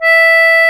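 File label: CONCERTINA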